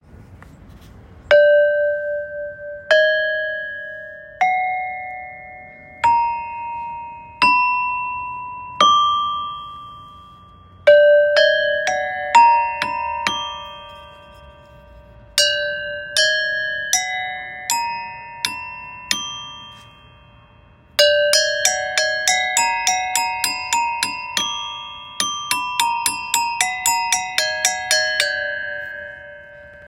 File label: Tin bells with a special coating; Custom made bell rack. Bells are detachable if needed. Mallets included.; Bright melodic sound; Dan’s Tin Bells; Motherland Music